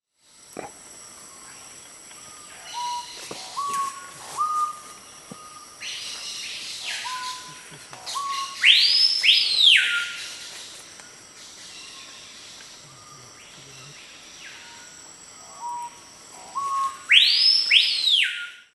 Canto da chorona manchada

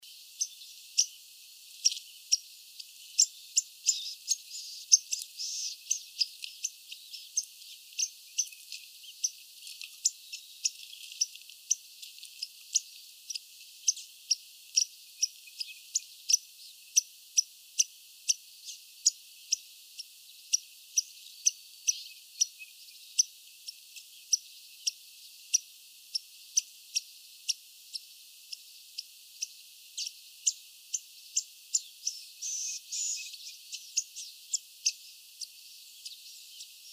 canto da maria preta de penacho

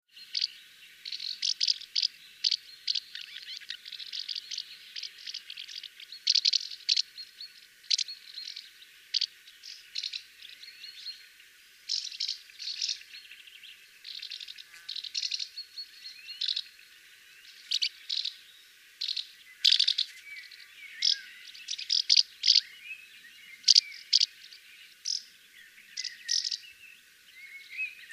Canto da Andorinha dos Beirais

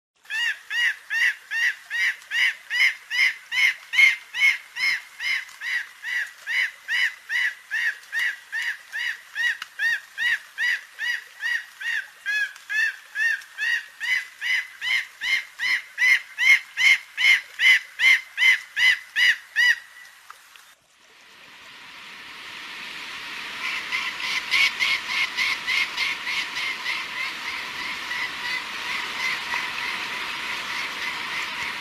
Canto do carcara carunculado

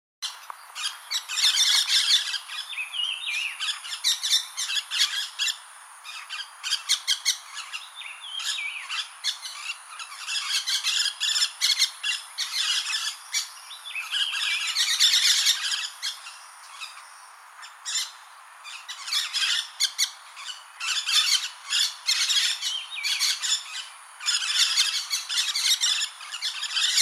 canto da tiriba de testa azul

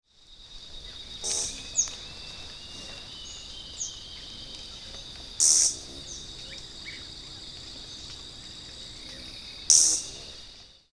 canto do topetinho vermelho

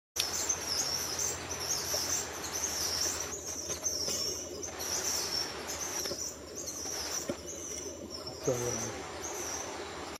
Canto do barbudo pardo

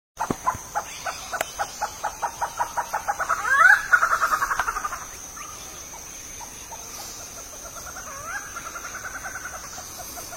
Canto do malabar grey

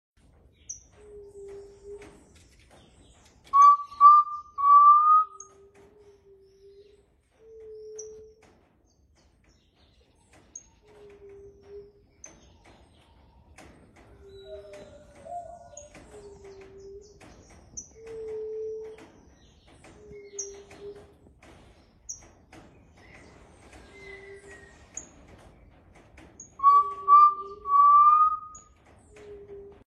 Canto do socoi zigue zague

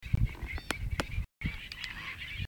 canto do cabeca seca

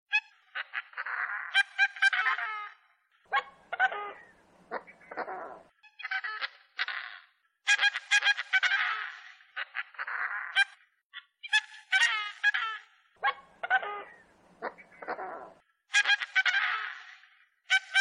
canto do capororoca

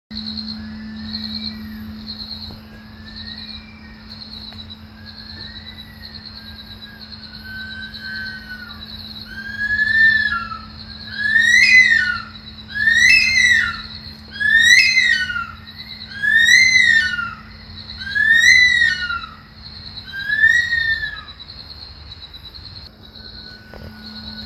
canto do alcaravao do senegal

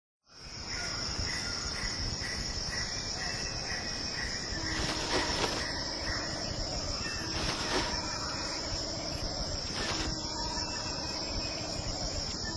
Canto do Maleo

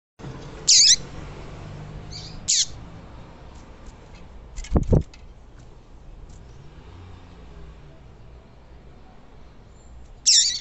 canto do papa capim femea

